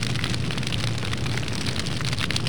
fire

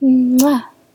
kiss female

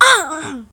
female death; ufoai; soldiers

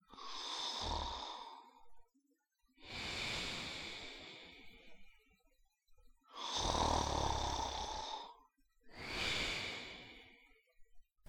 sleep

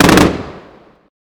grenade launcher; ufoai; weapons

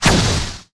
bullet gauss; ufoai; weapons

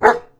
kobold bark

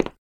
tile, footsteps

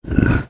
monster

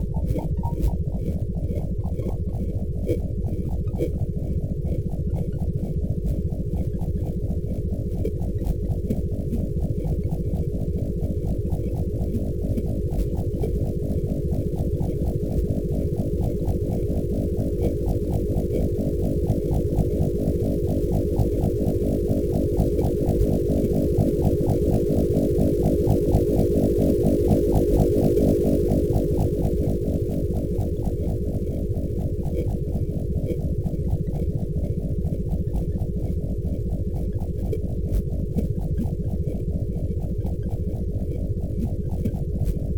mine pump, ambience

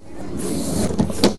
door close

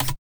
move, aliens, bloodspider

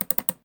window fold

